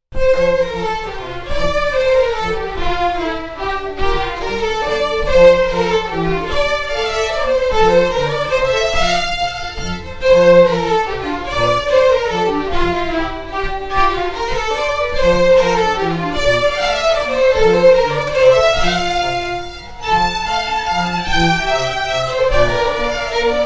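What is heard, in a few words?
Waltz, Scottish Fiddling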